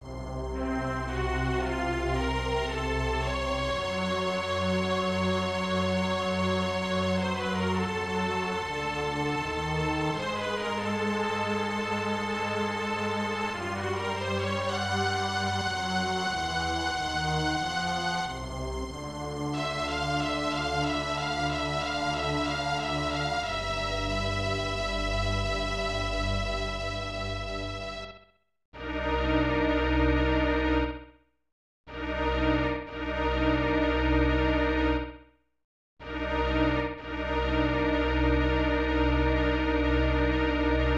Dungeon; bazoe mahou sekai ancient magic; snes

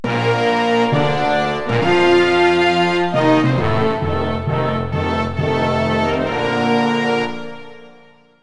Anthem Argentina; Buzz! The Mega Quiz; PS2

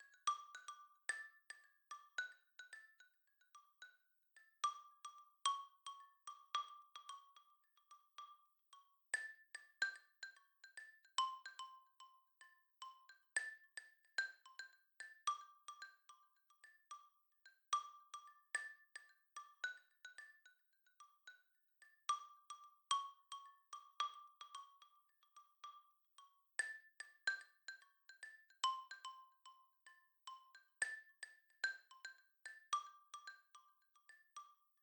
marimba; Toki Tori Collection

